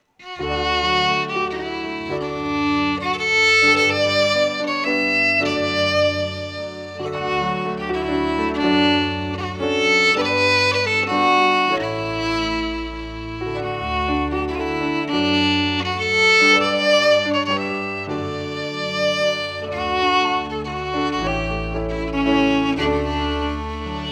Lullaby FIDDLE PIANO

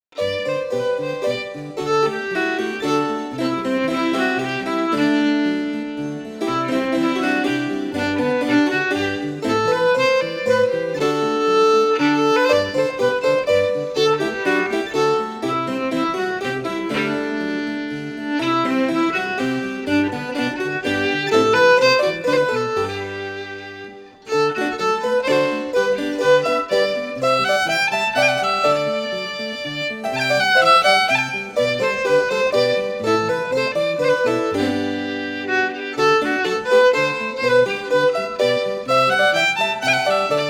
Minuet, FIDDLE FIDDLE

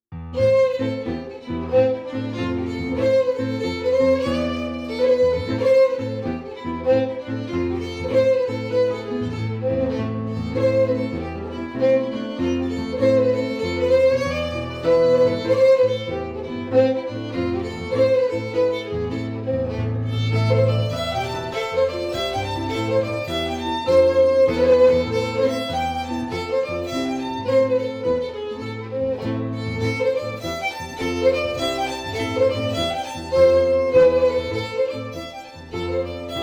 FIDDLE PIANO; Jink & Diddle Book o' Tunes 2021

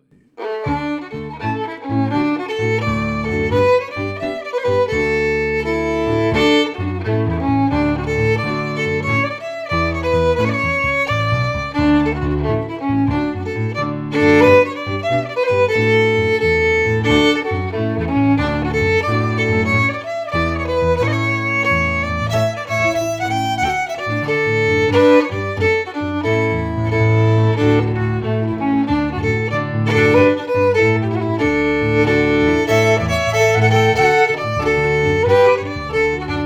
Jig FIDDLE PIANO; Jink & Diddle Book o' Tunes 2021

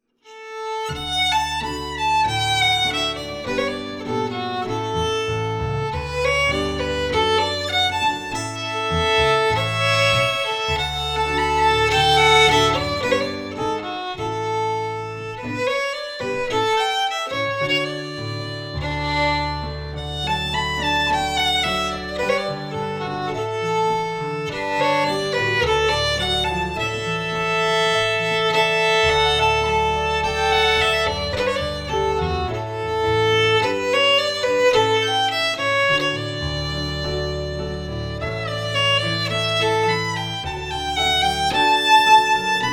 FIDDLE PIANO; Jink & Diddle Book o' Tunes 2021

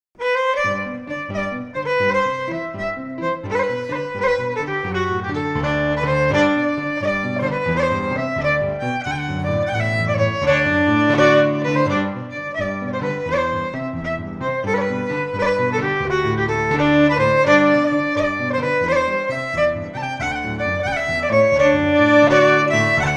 Quick Step FIDDLE PIANO; Macglashan pg10; John Macglashan col 1796

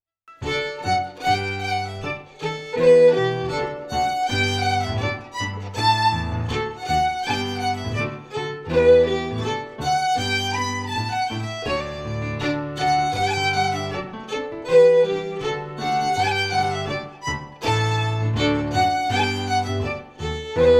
FIDDLE PIANO; Jink & Diddle Book o' Tunes 2021

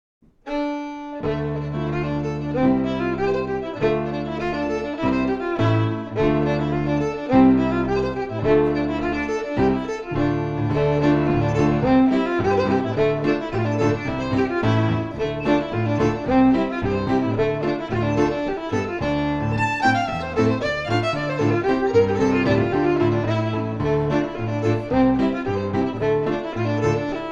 FIDDLE PIANO

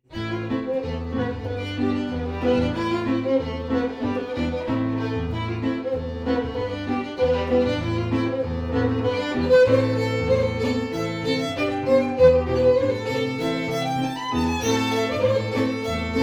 FIDDLE PIANO; Jink & Diddle Book o' Tunes 2021

